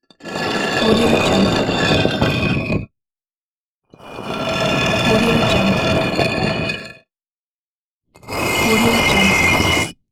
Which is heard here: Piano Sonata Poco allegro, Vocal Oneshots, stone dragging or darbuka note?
stone dragging